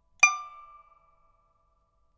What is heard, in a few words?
pipa; chuYinKouXian